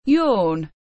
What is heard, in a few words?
yawn